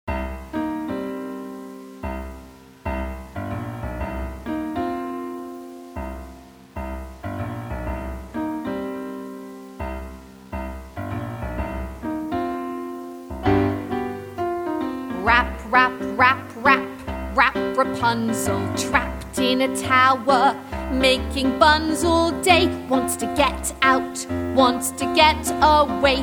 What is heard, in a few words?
Rap rap rapunzel (with vox)